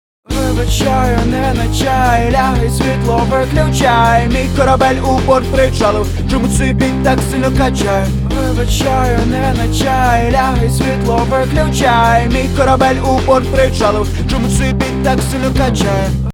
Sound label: rock